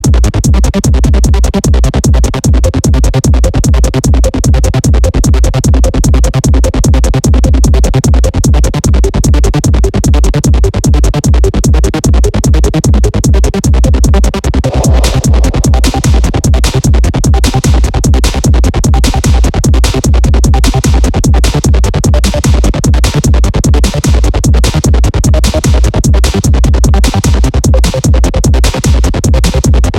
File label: Dance for Trance